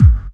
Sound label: DR. LOOP, DRUMS; X-Static Goldmine 3